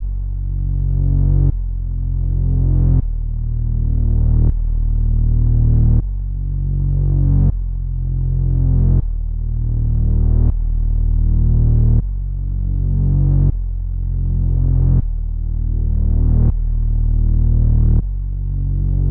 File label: 80 BPM A# Min Bass, Lofi; Melodies; Loop Stems; Cymatics; GHOST (Beta Pack); Away Again